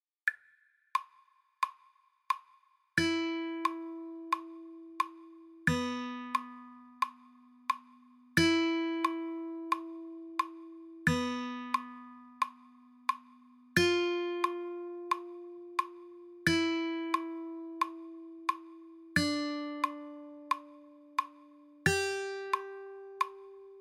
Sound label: strings 1 2 whole, guitar